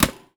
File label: Foley